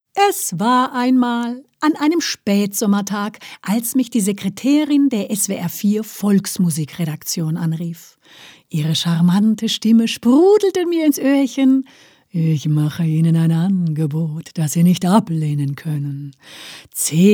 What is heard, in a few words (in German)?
Volksmusik